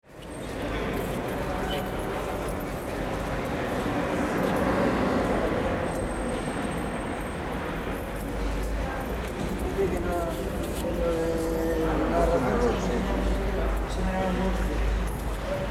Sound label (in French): fondu enchaine madrid la almudena